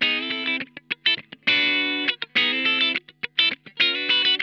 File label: Funk Guitar; SPOT ON; Zero G - Funk Guitar